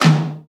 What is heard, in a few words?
TOM, KIT Real-Kit; 90 s Sample CDs; Sound & Vision - Gigapack I CD 1 (Roland); Real-Kit s10